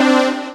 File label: Dance Mega Synth; Best Service; 90 s Sample CDs